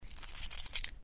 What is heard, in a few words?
Coins